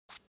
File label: object household brush single short